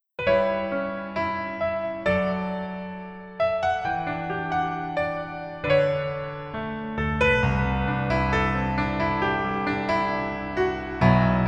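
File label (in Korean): Piano version 남자키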